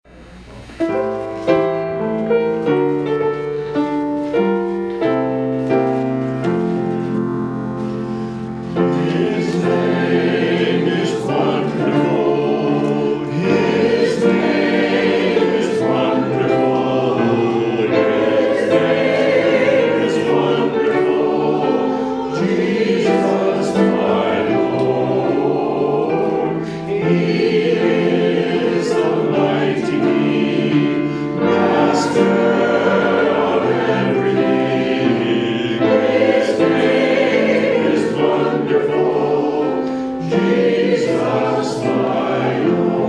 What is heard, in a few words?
Hymn; His Name is Wonderful